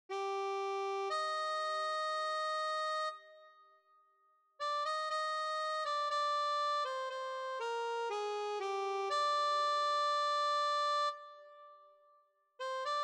harmonica

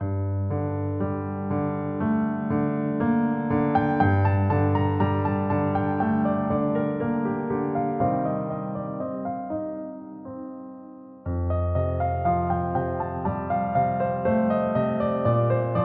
piano

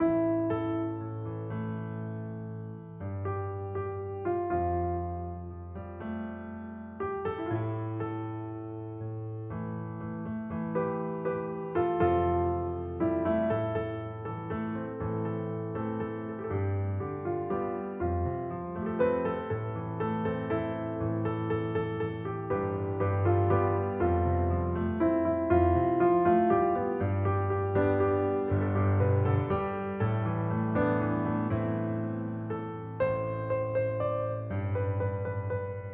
piano

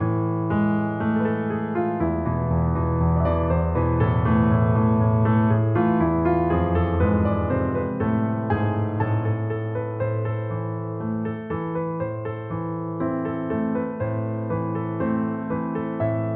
piano

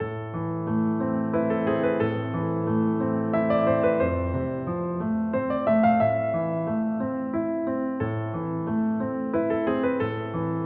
piano

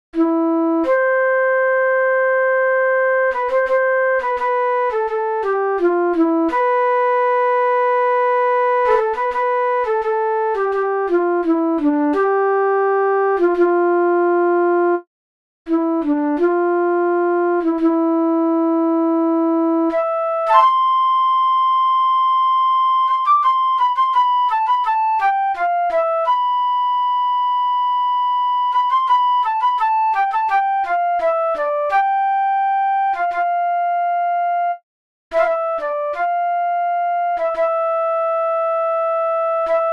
flute

